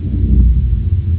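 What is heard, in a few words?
engine hum; duke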